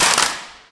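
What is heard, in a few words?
physics clipboard impact medium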